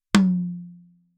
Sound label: Pearl Mlx Tom